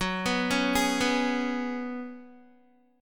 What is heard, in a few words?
Gb Suspended 2nd Suspended 4th, Guitar; Gbsus2sus4 arpeggiated; 14,14,11,13,11,x; 4E,4A,5D,5G,5C,6F